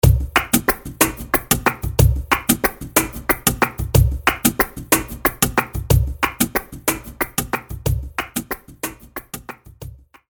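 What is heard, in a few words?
Bulerias por 6 184bpm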